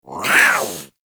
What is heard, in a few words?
Feral Vocal Attack Short F; Warframe; Catbrow